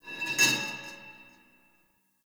Pipe Cement Scrape; Warframe; Ambience; Nineteen Ninety Nine

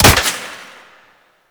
Flak Cannon Proj Hit; Warframe; Grineer

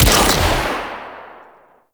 Arch Prisma Shot, Rocket Crossbow; Warframe; Archwing; Fluctus

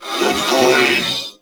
Purgatory Warrior Charge Scream; Warframe

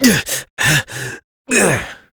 Death, Adult; New War; Operator

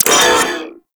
Mech, Weapons; Warframe; Cephalon

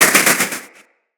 Player Resurrect Start; Tenno

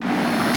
Fire Blast Build Up; Warframe; Sargas Ruk

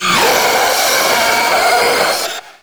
Proto Infested Ancient Heavy Bark, Enemies Vocals; Warframe

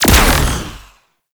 Space Figter Fire; Warframe; RailJack; Corpus; Space Fighter Gox Agent

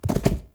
Stag Stampede Gallop; Powers

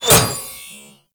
Kunai Impact Default; Warframe; Hit Effects; weapons

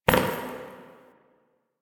idle single step; Ambience; Warframe; Entrati; Tagfer

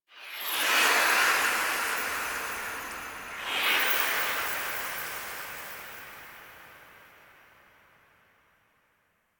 Portal; Ballas Lotus Operator Intro; Warframe; The New War; Cinematics

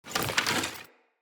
UI Faction Equip Grace

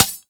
Foot Stab; Warframe; Corpus; Arachnoid Hunger; Abilities

